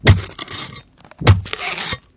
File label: bone break stabbing x2